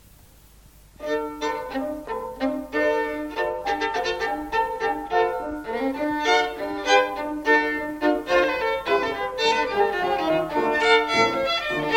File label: Violin and Piano, Polka; Six Jewish Dances for Violin and Piano; Palestinian